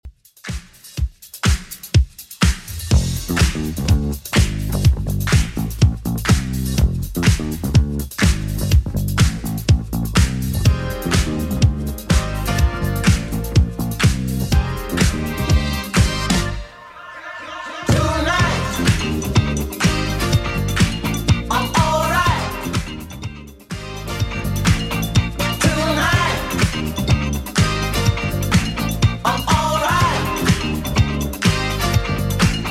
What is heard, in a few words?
80s Redrum 124 bpm CLEAN